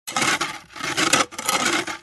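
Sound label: sounds of shovel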